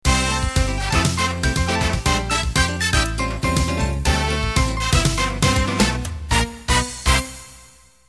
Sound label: Samba Ballroom End